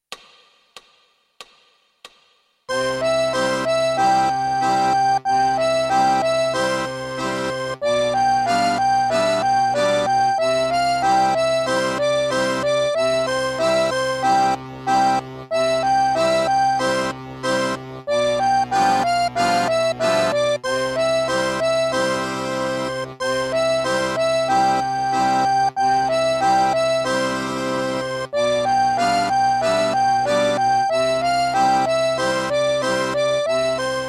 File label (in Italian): Contrabbasso Fisa Tango, Fisarmonica